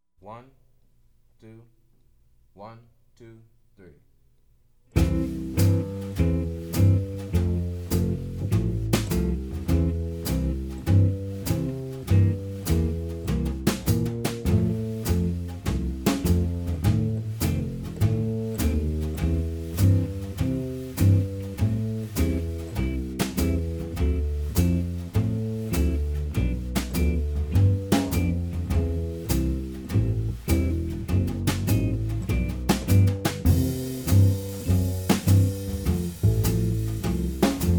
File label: C Blues Guitar Bass Drums; jazz